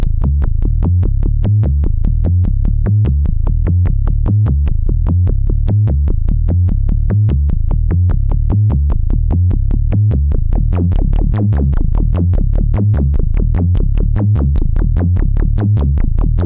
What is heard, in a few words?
switch burst steiner; cgs synth